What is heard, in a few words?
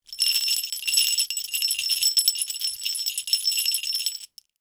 jingle bell